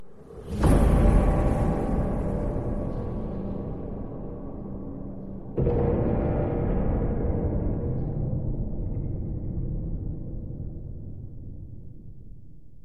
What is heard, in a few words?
Discovery Dread; Skyrim; The Elder Scrolls V